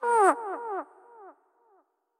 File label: Gigabat Aggro